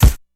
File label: raw bass drum sound d sharp key; kick drum